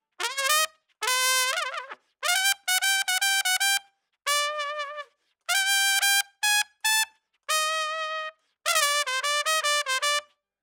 Trump; RE20

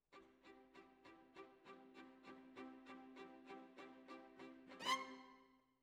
Violins, jazz for piano

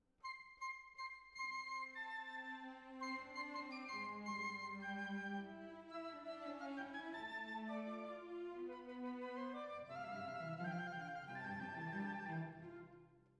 Violin Solo

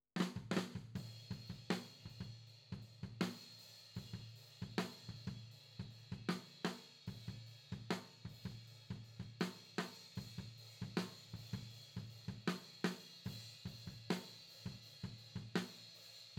TOM

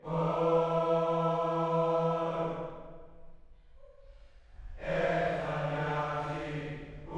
Choir

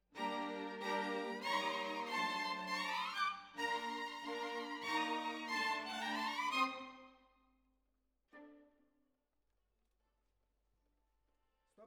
Violins Over

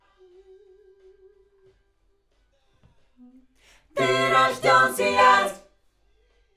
Schoeps AKG, piano

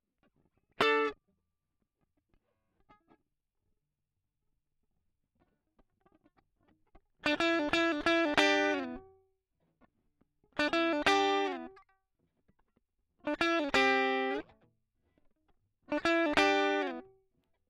Guit Solo Line, RNR for piano

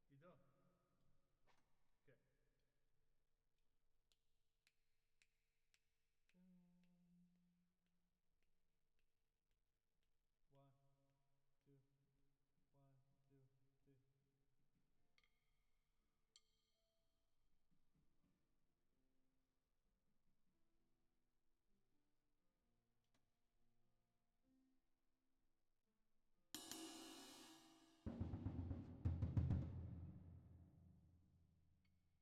Drums Verb

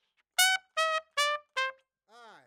Trump

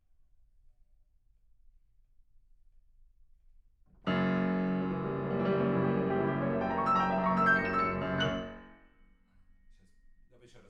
PIANO

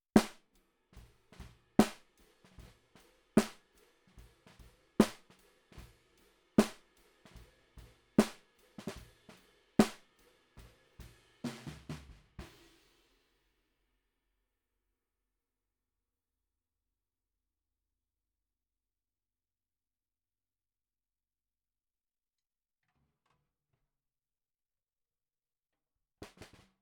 SNR bot

